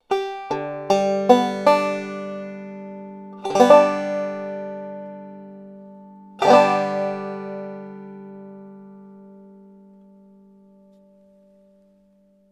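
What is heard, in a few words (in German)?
einfacher anschlag in g dur, banjo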